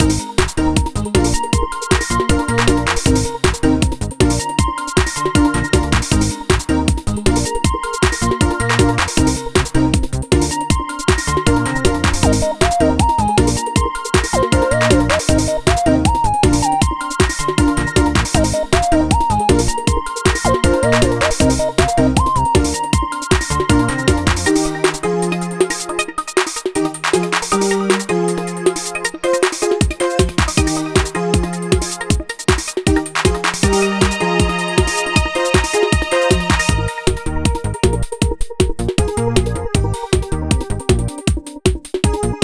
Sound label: BGM Shop; Nicola Kanshuu Model Oshare Audition Platina; 3DS